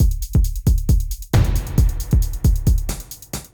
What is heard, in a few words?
Beat - Full, Grime, 135bpm; Zero-G Sound Sense Grime Scene; Construction Kits; A Positive Vibe